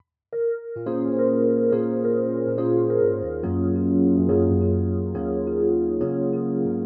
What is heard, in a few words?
Flanger